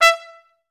TRS STAC E4; Best Service; Peter Siedlaczek's Advanced Orchestra

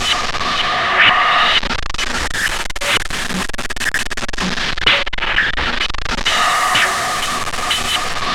PAIN SCANNER; Distored Reality

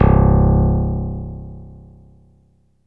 PIANO C1; Best Service Alpha Dance vol.2

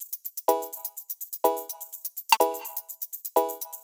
A Slice Of Pad, Drum Loops, 125 BPM; Sonic Foundry (Sony Creative Software); Underground UK House & Electro

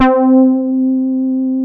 Poly800 C5, Korg; Back In Time Records; Korg Universe VOL-1

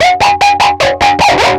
Overtone, Industrial, Nasty

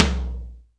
DRY T, drum; Crypton; DrumBase5000

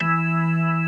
HAM E3 R, keybord

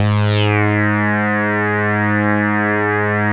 SAW RES DRY, POLYRES SAW; InVision Interactive; Keith Emerson; The Most Dangerous Synth and Organ

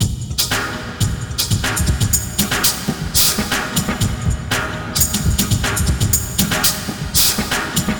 Vinyl Loop; Sonic Foundry (Sony Creative Software); Esoterik Beatz

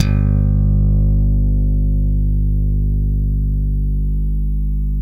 FUNK A 2, bass; Spectrasonics; Bass Legends

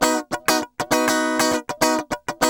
100 BPM

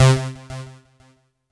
C2, synth line; 90 s Sample CDs; NiceBeats; SynthLine VOL-3

